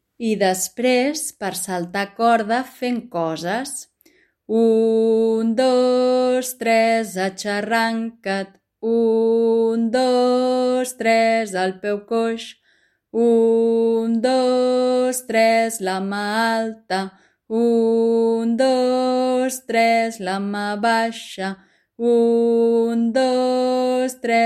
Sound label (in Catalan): saltar corda; ixarranca; jocs i cançons de carrer